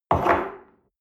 espresso place on table slide medium, restaurant cafe